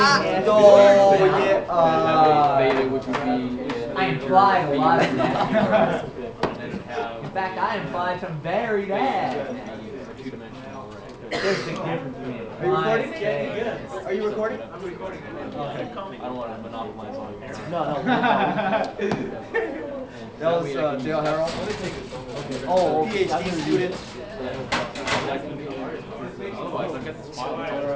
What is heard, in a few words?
Study Background Noise, Study Lounge